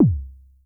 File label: K High sub, Vermona Mono15 Drums Kicks